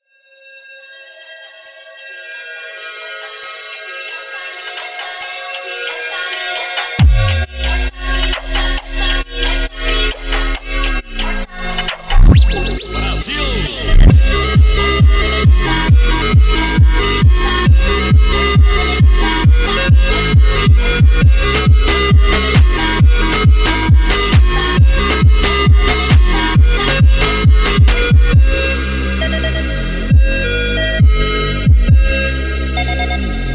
Sequencia melodica espacial

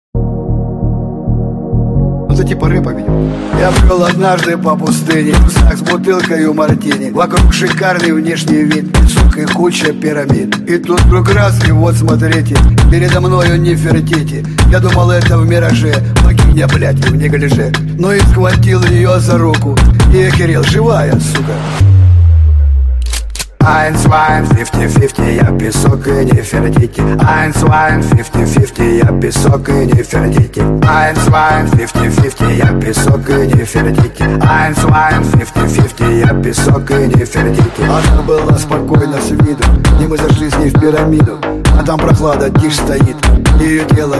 Phonk remix

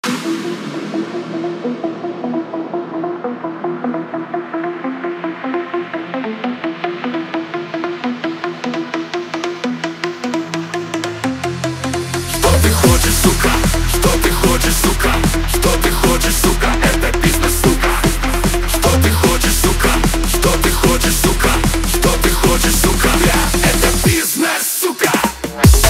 russian hardbass

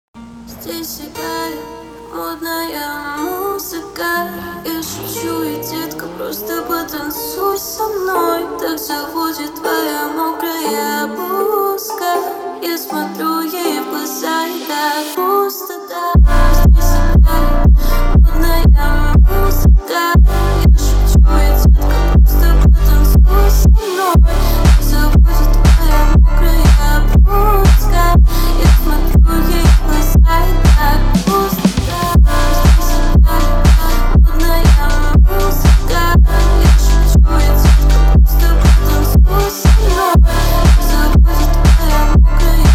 Phonk Remix

